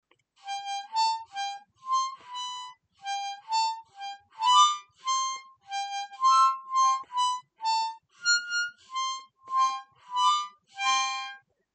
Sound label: clean, harmonica, Happy Birthday; towards the end one of the reeds got stuck